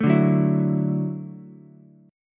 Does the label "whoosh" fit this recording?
No